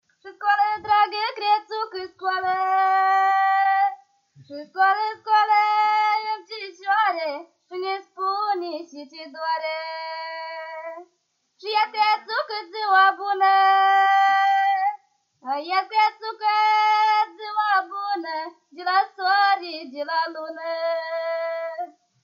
Bocet de fata mare; Vaslui

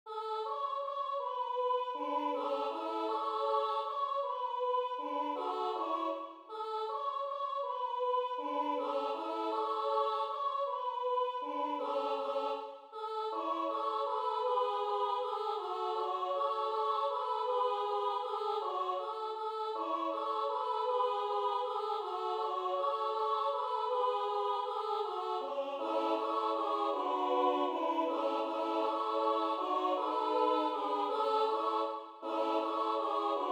colinde, Muntenia; Romania; Ia sculati boieri sculati

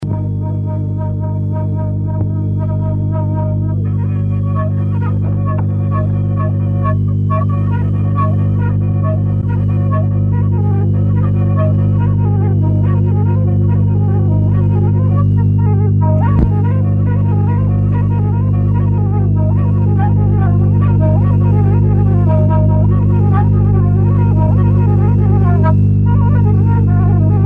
Coragheasca la fluier; jocuri si cantece batranesti; Vaslui

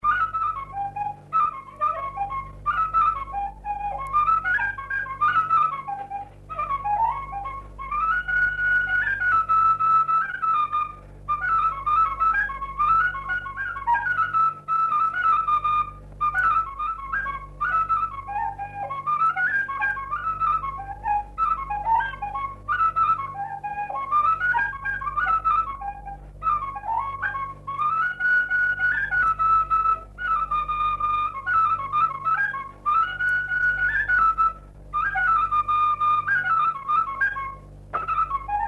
Galopul la fluier; jocuri si cantece batranesti; Vaslui

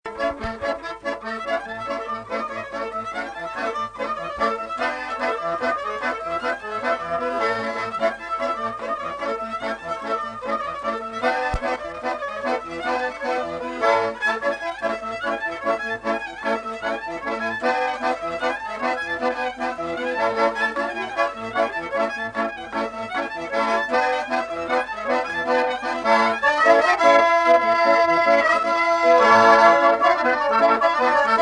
Ca la usa cortului la taraf; jocuri si cantece batranesti; Vaslui

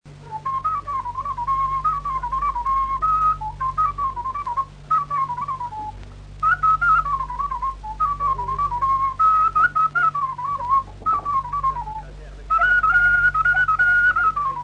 Ciobanasul la fluier, jocuri si cantece batranesti; Vaslui

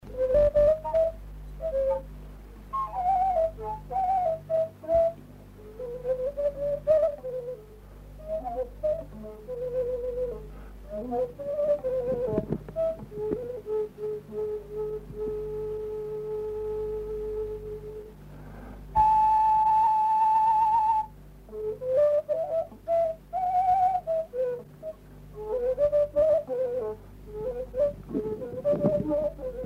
Doina la fluier; Fonoteca Arhivei de Folclor Vasile Adascalitei - CJCPCT Vaslui; cantece de dor si jale

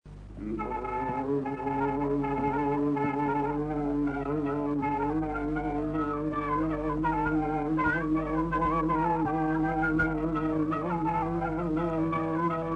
Cantec de joc II la caval; jocuri si cantece batranesti; Vaslui

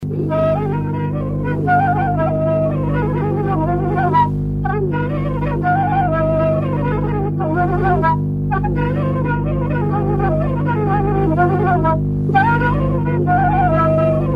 Cantec de joc la fluier; jocuri si cantece batranesti; Vaslui

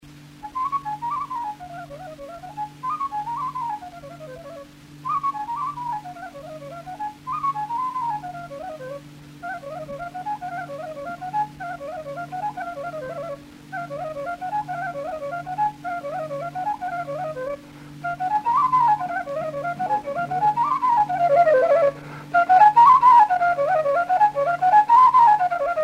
Rata la fluier; jocuri si cantece batranesti; Vaslui

